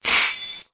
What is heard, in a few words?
Sad Mac Chime PCI Power Mac